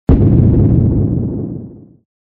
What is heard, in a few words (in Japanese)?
爆発音 長め モノラル